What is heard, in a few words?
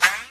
Dash; Superbrawl Universal Dash Sfx; Nickelodeon Super Brawl Universe; Android